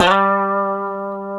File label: G 3, Guitars; Spectrasonics; Hans Zimmer Guitars VOL-1; 90's Sample CDs; COMP POP+VIB